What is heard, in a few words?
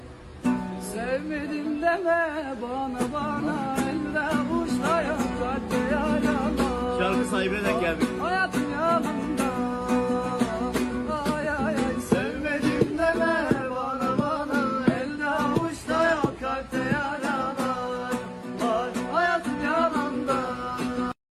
Guitar